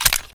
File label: famas clip out, weapons; garrysmod